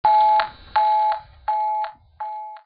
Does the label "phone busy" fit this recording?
Yes